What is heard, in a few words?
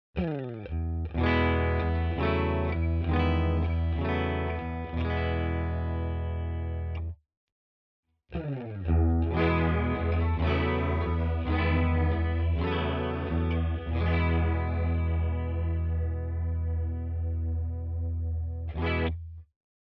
CHORUS Light; Walrus Fundamental